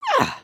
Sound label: breakdance grunt; emotes